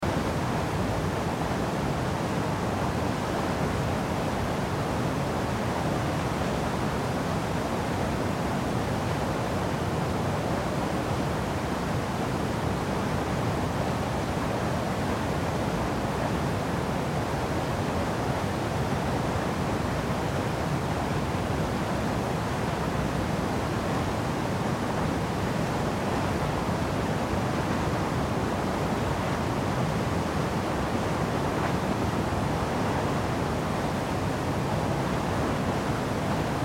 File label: white noise